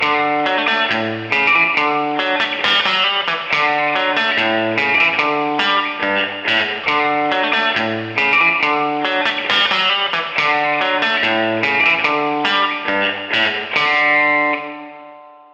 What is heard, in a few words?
Russian Folk